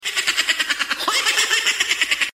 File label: Crazy Laugh